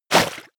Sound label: step walk water; schritte